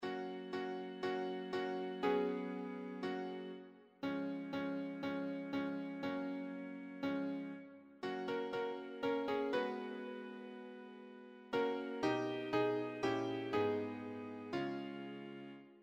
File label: audio sample piano